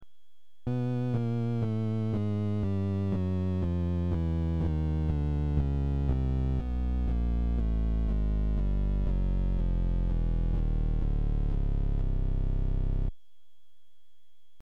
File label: Fuzz Bass And Treble, Rheem organ